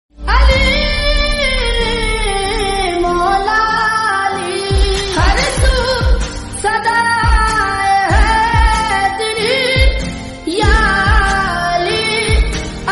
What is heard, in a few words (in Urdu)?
islamic naat lofi slowed